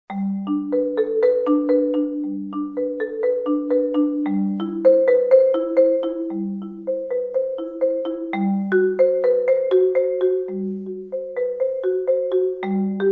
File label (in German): Bach Praeludium G Dur